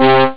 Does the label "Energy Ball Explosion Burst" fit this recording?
No